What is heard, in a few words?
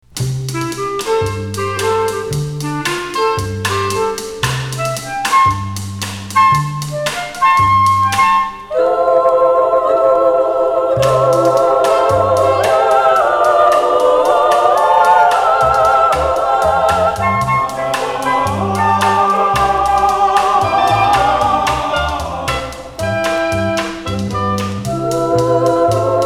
Night Jazz Romanze; Mozart